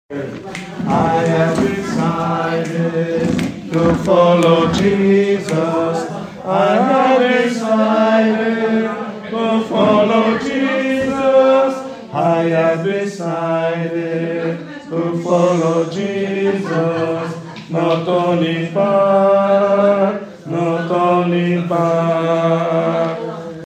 tenori; I have decided to follow Jesus; Voci; studio canti

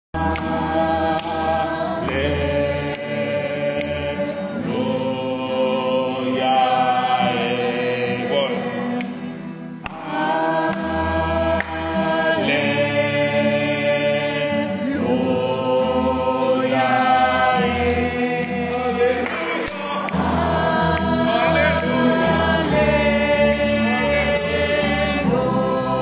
allaulia contralti

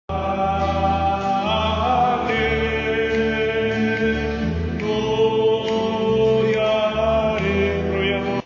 allaulia uomini, alleluia africano

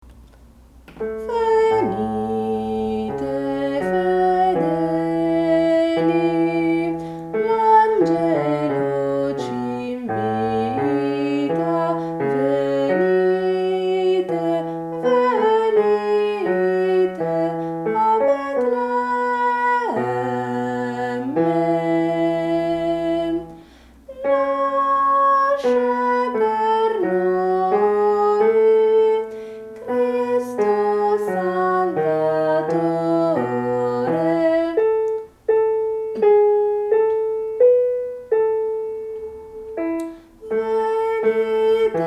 venite fedeli uomini

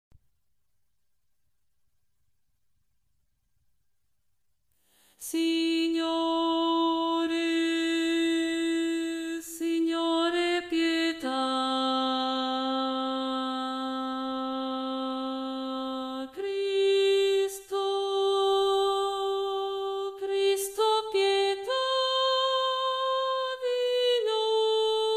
Signore pieta contralti; Voci; studio canti

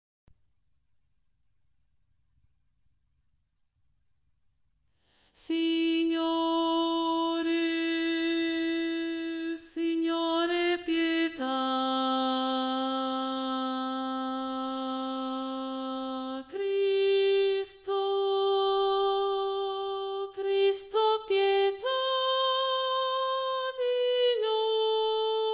Signore pieta tenori; Voci; studio canti

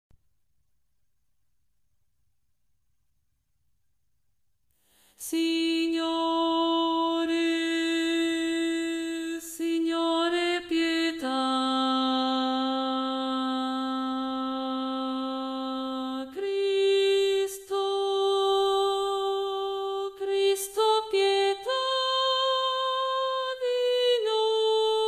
bassi DO; Voci; studio canti

